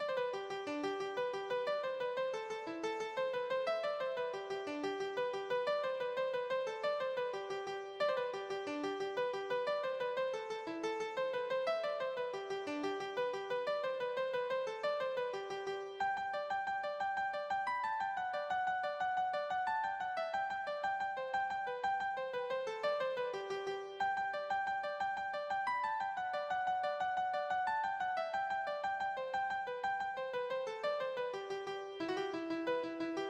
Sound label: irish jig medley keyboard